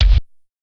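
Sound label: Bassdrum kawai K4 industrial electro; Drums; Polyend; Legowelt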